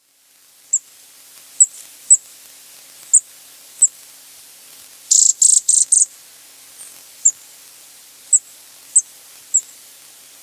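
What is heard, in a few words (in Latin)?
lophophanes dichrous, Sikory; czubatka szaropióra; z Nepalu; ptaki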